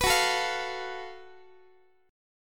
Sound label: F sharp Minor Major 7th Double Flat 5th, Guitar; strummed; x,x,14,16,13,16